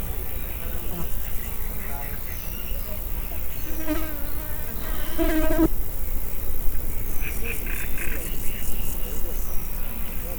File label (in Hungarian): rászáll a jobb mikrofonra, rovarok; termeszetben; tiszababolna